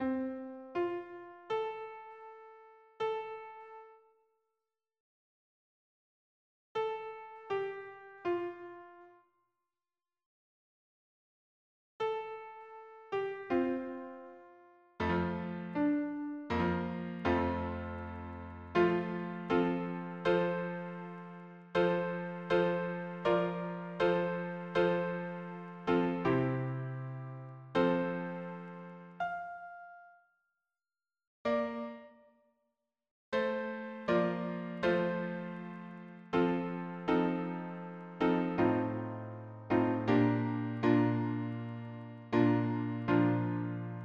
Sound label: harmony piano